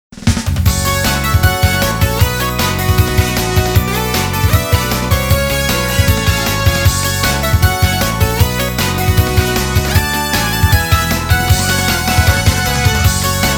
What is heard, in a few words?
Normal First String (Autumn)